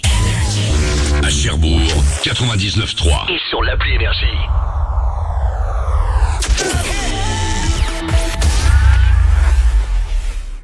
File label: JINGLE